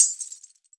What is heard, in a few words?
wrist, percussion; WORLD PERCUSSION; MIXED PERCUSSION; Best Service ProSamples vol.52; World Instruments 2